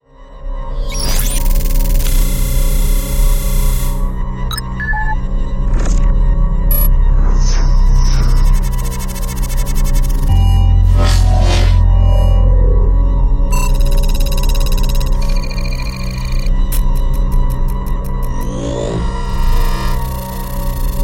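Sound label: UI, high-tech sound samples; 面向先进技术的屏幕 UI 设计师的未来音效; SampleTraxx; UI Designer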